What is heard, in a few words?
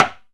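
low timbale closed; E-MU Producer Series Vol. 2 – More Studio Essentials; Composer; Timbales Xswitch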